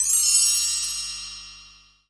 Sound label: BELL TREE; PERC; AKAI S-Series CD-ROM Sound Library VOL-2